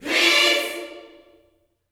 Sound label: PEACE CHD, Vocal Planet Gospel GOSP CHORD; Spectrasonics